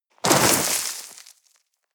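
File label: step gravel slip down debris short, footsteps; schritte; menschen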